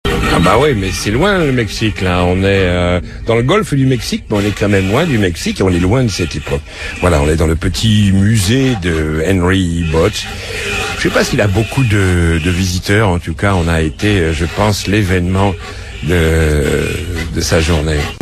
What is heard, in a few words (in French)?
Chanteur Country